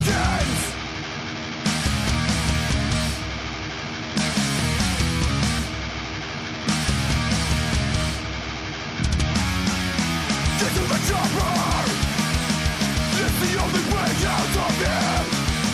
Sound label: austrian death ringtone